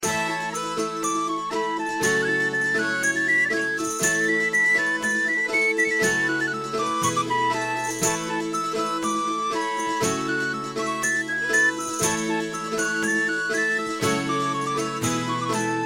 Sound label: smooth flute music ringtone